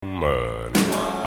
infra Bass

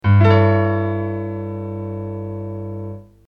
Chord A4 Plus

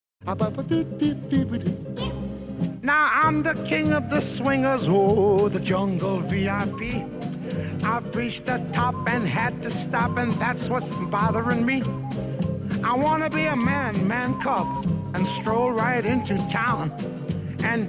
Minor Intro

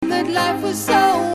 Bb Maj7

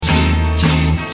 Diminished

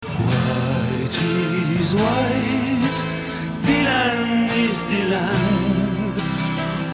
Motif

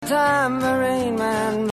Tambourine

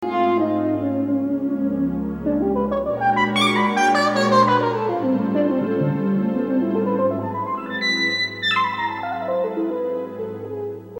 diminished Scales

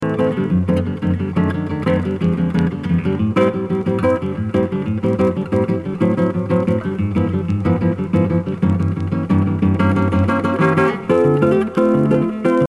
Plinking Chords

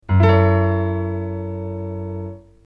Chord F sharp Min7

